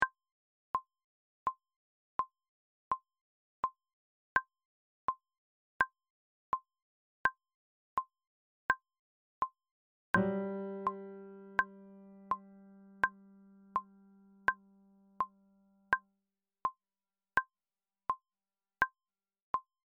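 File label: cuban 80 tempo tenor saxophone and mallets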